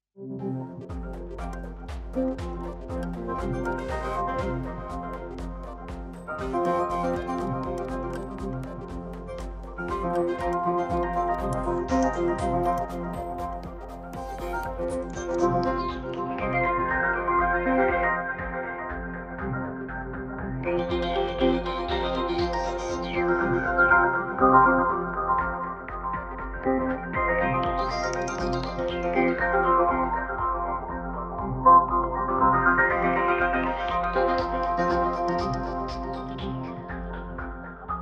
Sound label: Beetlecrab Tempera Sequence; Gamma